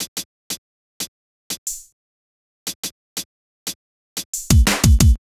Drums, 090 Cmin; Hip Hop Exotica; Apple Loops; Big Fish Audio

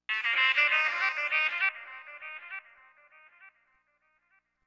Stab; Thirty-One Flavors

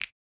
Snap Hit; Hip Hop

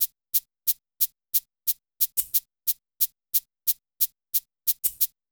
Hat, Drum Tracks; 090 Emin

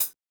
Hat B Hit; Hip Hop; 096 Cmin; Hip Hop Exotica; Apple Loops; Big Fish Audio

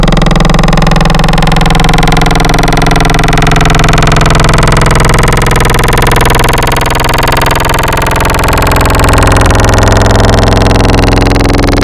Dusty, Growl Tables; Cymatics; Growl Tables Vol 2; Serum

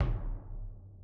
Kick B Hit; 087 Bmin

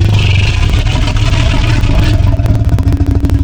Alligator, Wavetables; Cymatics; Serum; Animals for Serum - Gold Edition

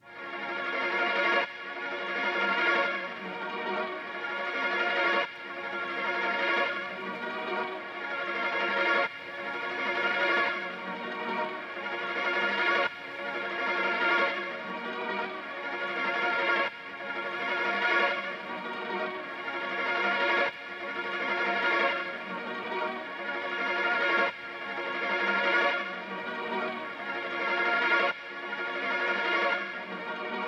126 BPM A# Min Strings; Cymatics; Slime; Destiny Gold Expansion

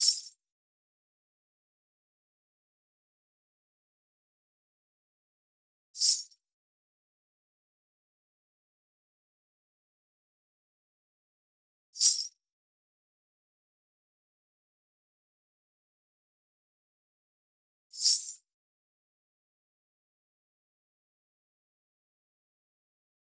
ghungroo 080, Hip Hop India 080bpm; Hip Hop India Modern Percussion

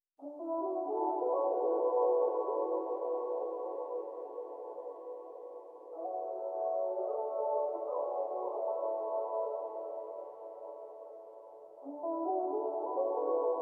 165 BPM B Min Stem; Cymatics; Destiny Gold Expansion Stems; For The Night

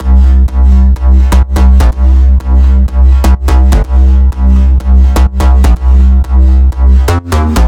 Big EDM Bass Loop C1; Future House; Future House Tools; Bass Loops

